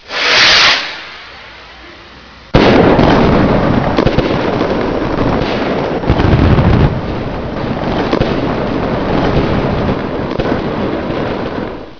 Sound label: firework